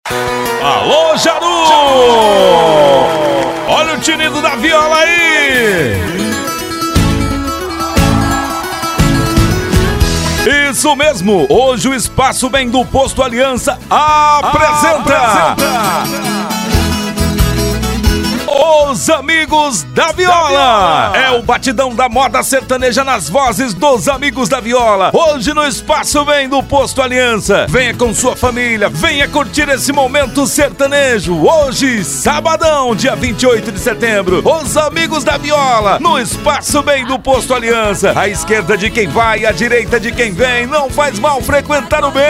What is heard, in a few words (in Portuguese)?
viola